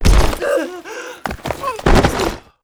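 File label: death, monster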